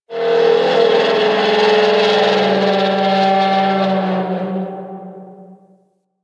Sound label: Metal Groans And Scrapes, Asteroid; Ambience; Warframe; Grineer Asteroid